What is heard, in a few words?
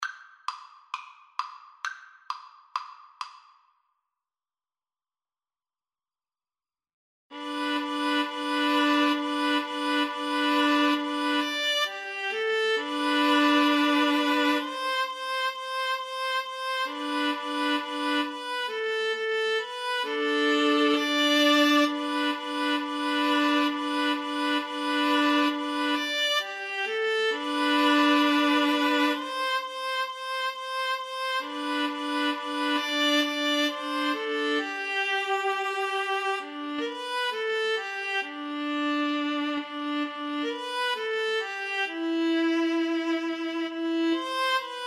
boogie viola duet